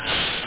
Minimize and Restore Up; StarTrek

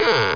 open door; PsiAngband

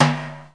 boom

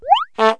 whiz honk, cartoon horns

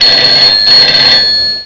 Phone; Vegas Poker

